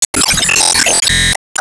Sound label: Enemy Temple Sword Shield Cross